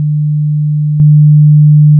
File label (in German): laut